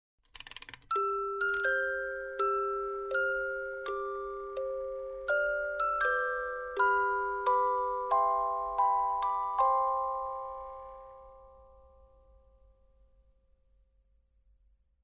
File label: MUSIC BOX THEME